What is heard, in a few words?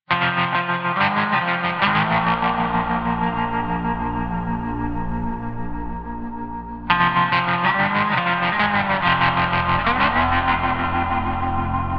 western guitar